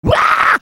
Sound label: CARTOON